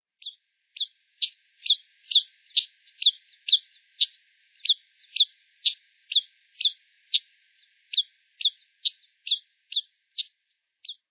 beija-flor-de-orelha-violeta

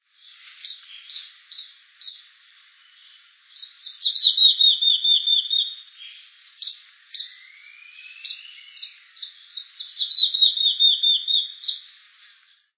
vira-folha-de-bico-curto

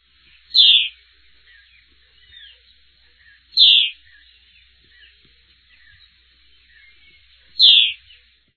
noivinha branca

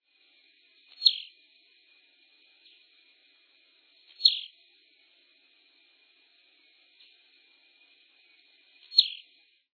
tiziu

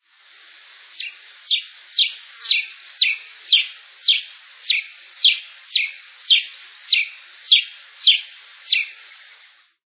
pipira-preta

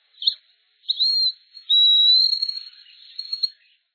tijerila

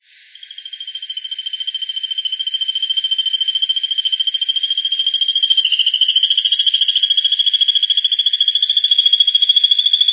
cuspidor de mascara preta

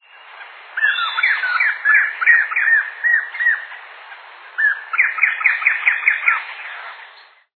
chororo-negro

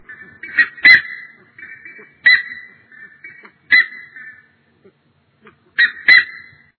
curicaca

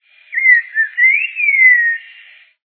bico-encarnado

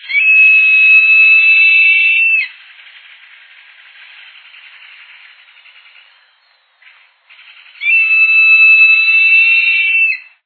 gaviao-preto

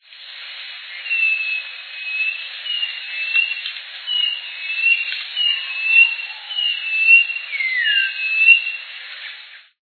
chorona-cinza

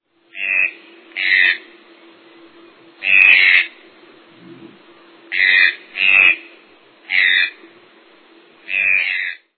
tucano de bico verde

